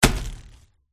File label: archer attack contact bottom